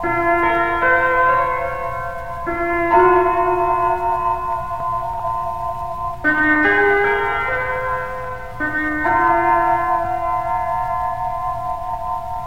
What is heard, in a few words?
piano, Sad